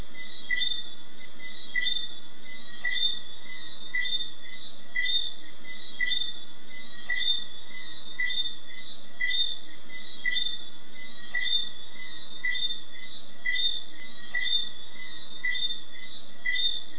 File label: jam tree frogs; Negril; Ahh, nothing says your back ‘home’ in Negril like the sound of tree frogs!